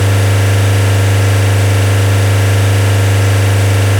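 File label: Toyota RAV4 II 2.0 16V Diesel Engine, onboard, 3k RPM loop; mono; Soundholder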